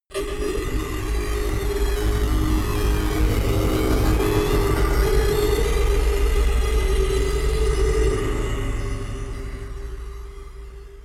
danger rumble, horror

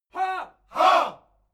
military shout ha

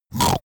snore short; soundpool

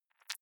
click noise, signale

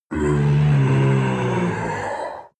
demon dark voice thick moan long; Creatures; kreaturen

